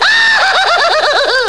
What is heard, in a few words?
laugh giggle; CHATAHOLIC'S